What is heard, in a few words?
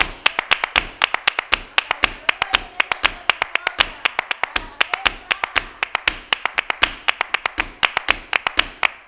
LONG CLAP, LNG MULTCLAP; Best Service; World Colours